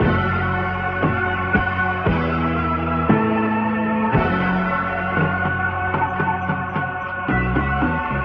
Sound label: keys sad finale, Phonky loops